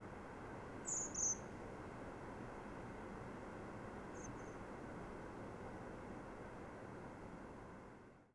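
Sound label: double different shape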